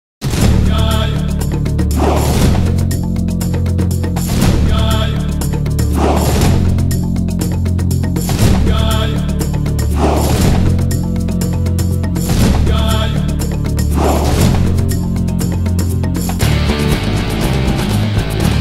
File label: Tribal; Legends of Wrestling; Kamala